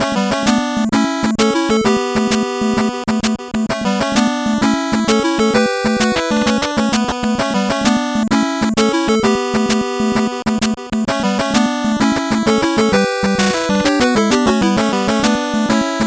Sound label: 8bit bgm slow